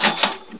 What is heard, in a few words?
Pick Diamond; Miner Max